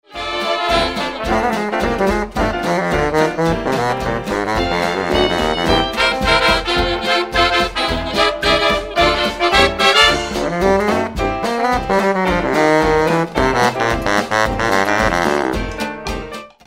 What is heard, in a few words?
sax